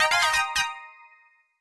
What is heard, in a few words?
Equip Powerup